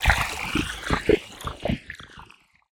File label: synthetic liquid short texture; sonniss gdc